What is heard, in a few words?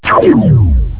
RAYGUN; Kiddie Ride; Batman (Raygun1, Raygun2); Kiddie Ride Sound & Control